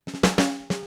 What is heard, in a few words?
Drums Intro; Sonic Foundry (Sony Creative Software); What it Is! 70s Analog Funk